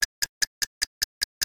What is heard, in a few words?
Timer